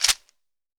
chekere; Africa

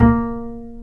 UPRIT BS A#3, wood bass

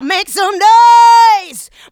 Female Vocals; Houseworx

